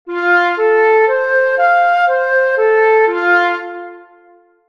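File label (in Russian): fa magor trezvuchie, flejta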